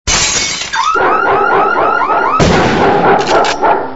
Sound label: glass alarm dog; hl2dm